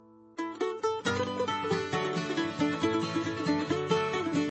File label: jingle ringtone; geico